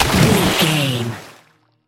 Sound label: water splash object body